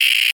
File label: Warrior Attack Miss; we are the dwarves gamerip 2016